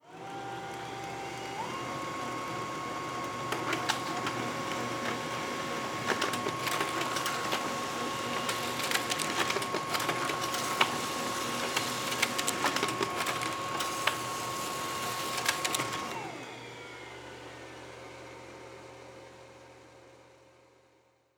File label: Laser Printer